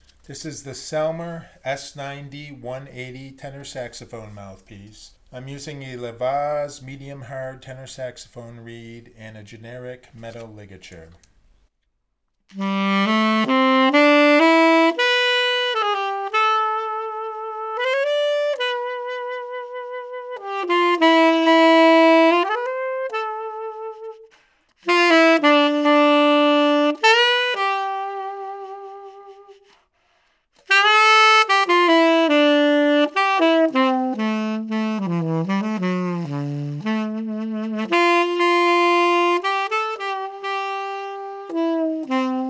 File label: tenor saxophone; Selmer S90 180